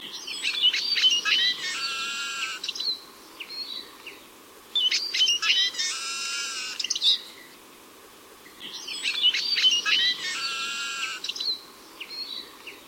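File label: greenfinch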